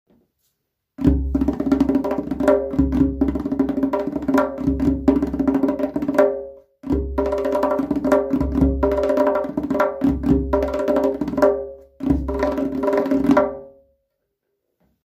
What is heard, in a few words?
Djembe